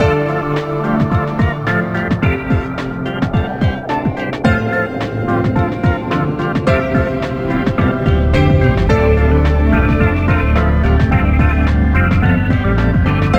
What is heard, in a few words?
Water; Heroki